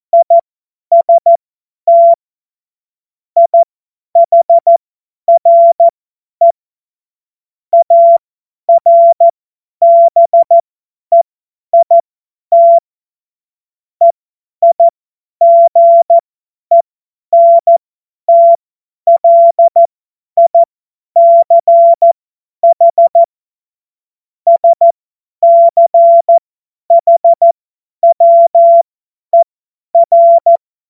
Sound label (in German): Witz, cw morsen; Tempo 50 F70